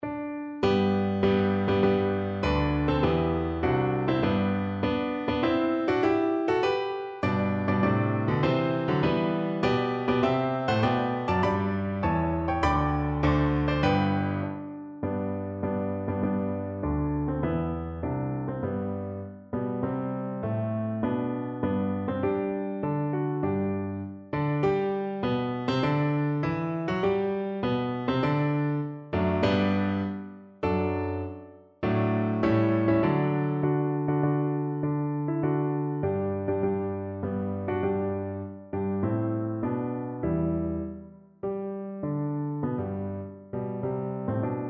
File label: heart of oak viola